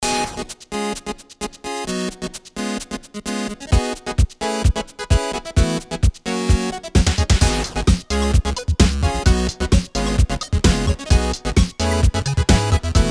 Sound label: Chiptune